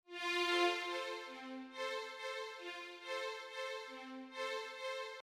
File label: waltz